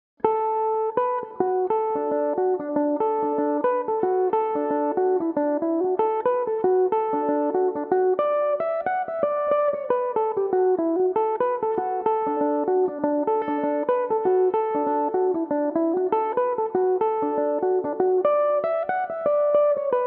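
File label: jig d major, mandolin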